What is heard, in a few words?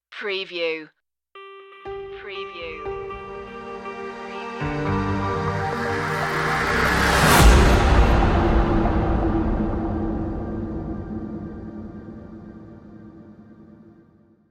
descant sky stinger